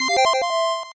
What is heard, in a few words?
8bit se